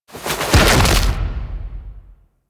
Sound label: Melee Slam Impact; Warframe; Teralyst; Ropalolyst; Abilities; Melee Grab